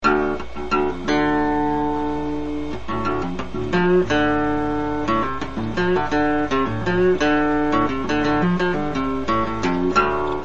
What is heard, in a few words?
menu music